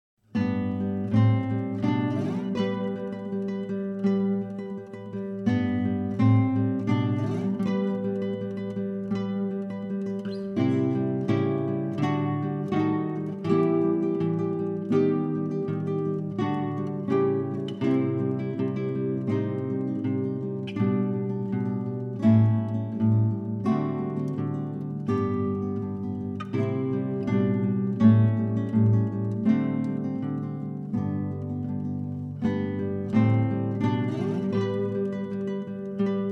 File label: sans flute